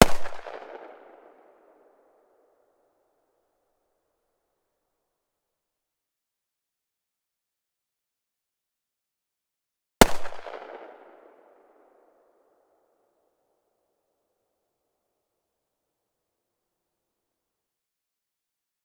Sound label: Desert Eagle - FIRING - 4m Left; Pole Position; Desert Eagle .44